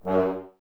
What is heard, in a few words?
Brass F.Hrns F#; Miroslav Vitous - Woodwind Brass Ensemble